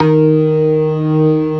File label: ORGAN, C3 PERC; Keith Emerson Signature Series